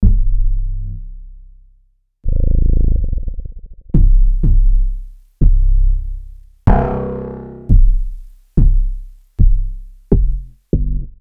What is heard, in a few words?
Bass c